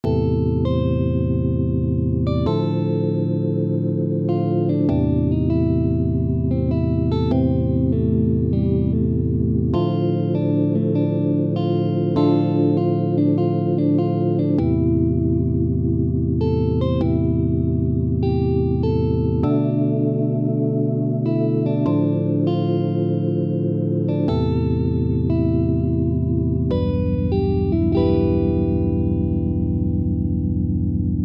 Easy Solo with Chord notes

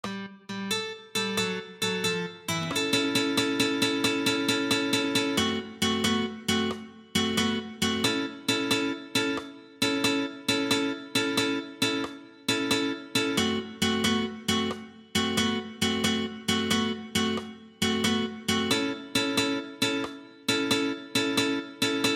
Acoustic 12 Bar Blues Rhythm

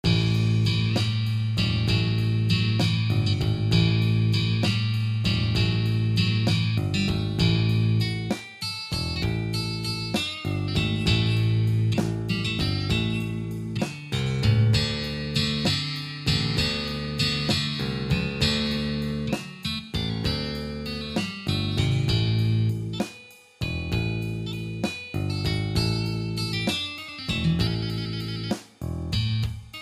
Acoustic Blues in A

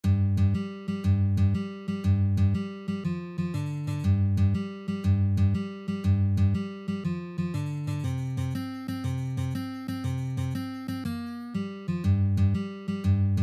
Shuffle in G

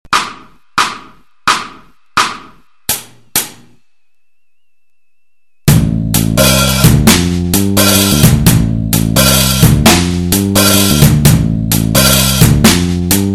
Style Solo BT

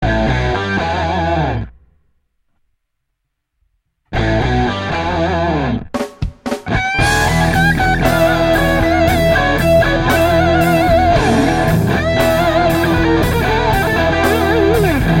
Sample Les Paul

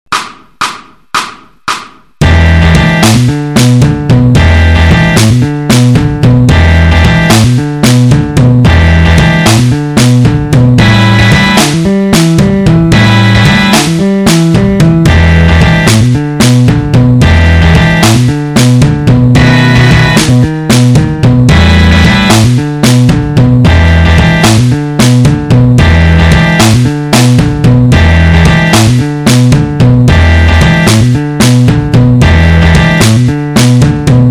Style Solo BT

